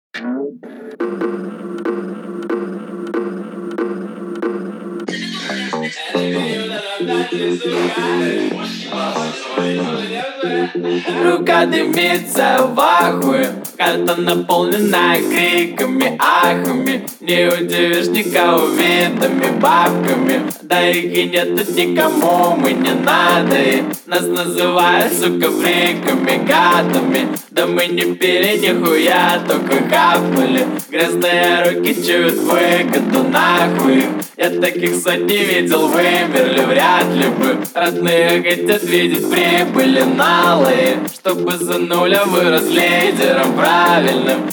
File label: Low Bass, 21-23 Hz